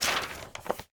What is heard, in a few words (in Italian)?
Bacheca; BLIND QUEST - The Enchanted Castle